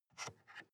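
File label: sports car interior ventilation adjust slide soft; verkehr transport; autos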